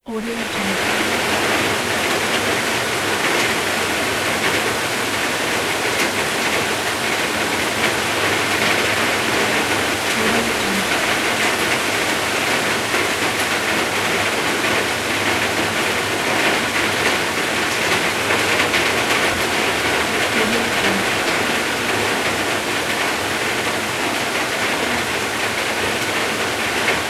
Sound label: the rain